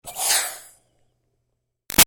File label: Steam Release Iron Chuff Doppler; Miscellaneous Household Sound Effects